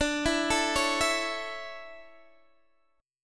D Major 7th Suspended 2nd, D, down, Guitar Open D

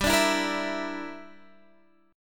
G Minor 6th, Guitar Standard

